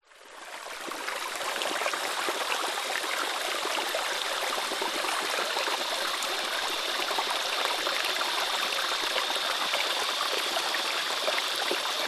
nature creek flowing forest insects loud cicada australia